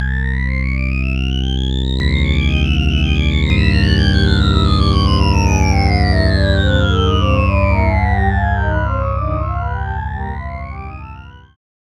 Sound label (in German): FM mit additiver Filterung